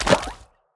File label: Otter footstep